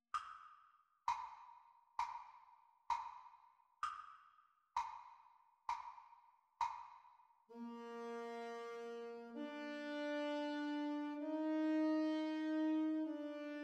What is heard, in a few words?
Sax Duets Playalong; Grade 1.2; Practice Speed